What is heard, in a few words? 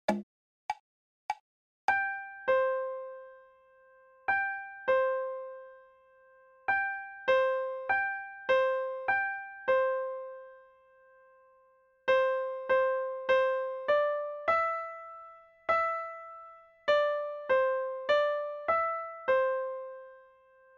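Sweetly Sings Piano Accompaniment No Solo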